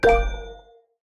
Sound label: incoming order